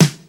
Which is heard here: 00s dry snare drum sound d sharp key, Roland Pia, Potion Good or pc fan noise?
00s dry snare drum sound d sharp key